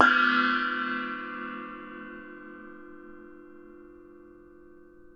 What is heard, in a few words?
NEPAL CYM, Asian Cymbals